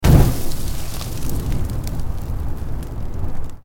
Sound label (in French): feu depart; deadmaze